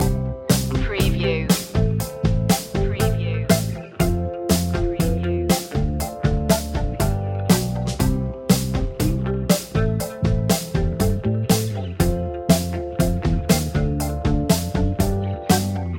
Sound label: joyful pop loop